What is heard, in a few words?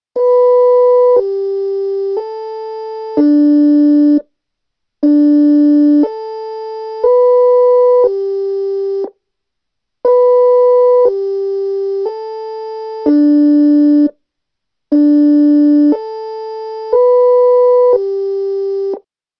siren chime